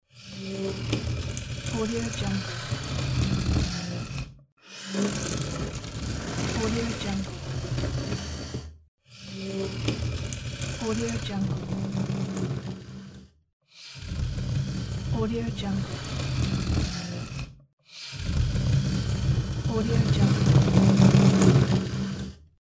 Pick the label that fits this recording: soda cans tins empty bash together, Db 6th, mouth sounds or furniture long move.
furniture long move